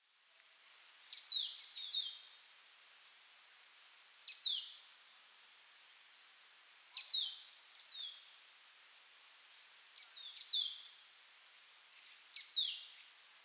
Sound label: Poecile palustris; SETE